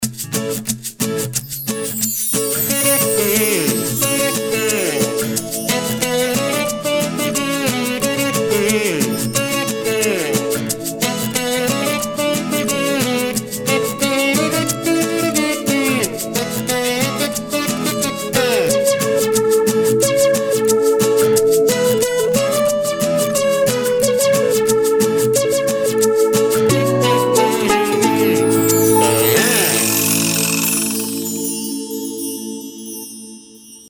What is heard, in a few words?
Happy Kinder